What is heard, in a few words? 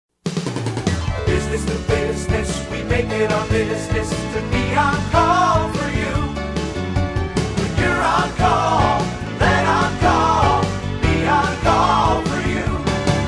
jingle